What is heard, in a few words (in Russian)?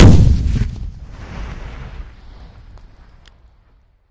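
дальний грохочущий взрыв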